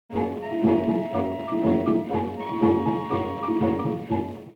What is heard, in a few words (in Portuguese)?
Batuque